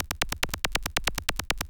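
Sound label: SNARE ELECTRO 140, Techno Drum; Techno2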